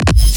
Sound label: Glitchy Impact Explosion